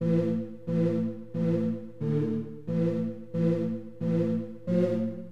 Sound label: VOX PAD, HipHop, Keyboards; LiveAct Pool 1